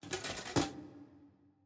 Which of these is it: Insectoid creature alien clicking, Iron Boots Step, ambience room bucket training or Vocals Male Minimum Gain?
ambience room bucket training